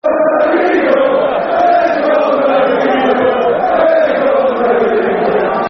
Football Chants (Soccer Songs), Liverpool; Where's Your Mourinho; Liverpool Lfc

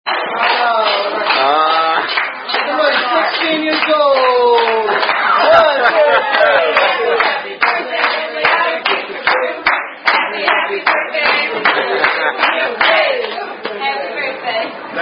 Happy Happy Birthday

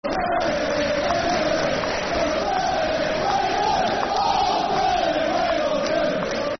We Love You Bury Football Chant; Bury Bfc

